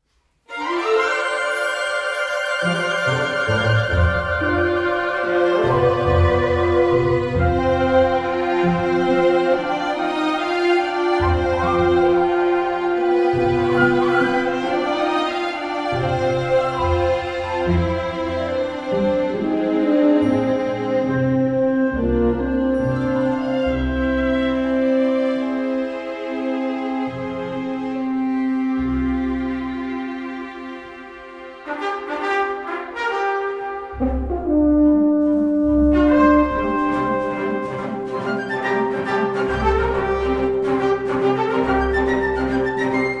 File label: Orchestral Energetic Fantasy